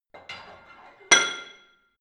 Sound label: barbell plate put on hit ring, fitnessstudio; sport freizeit